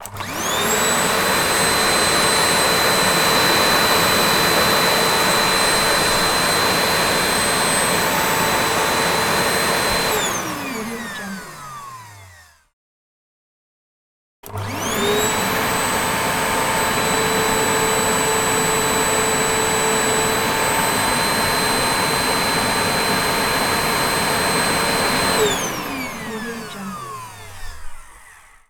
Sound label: vacuum cleaner